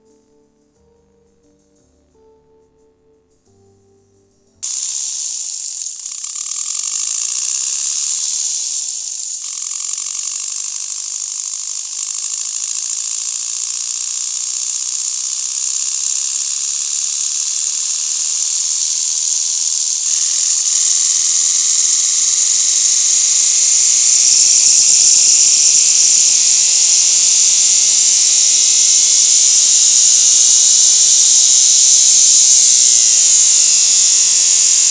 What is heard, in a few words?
knock bandpass